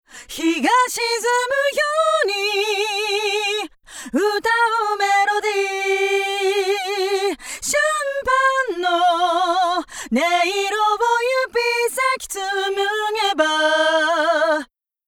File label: Chorus Flanger Mix Max